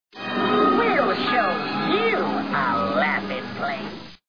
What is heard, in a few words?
splash